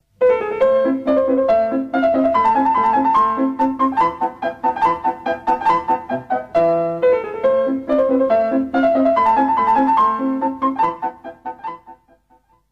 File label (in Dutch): piano; of klavecimbel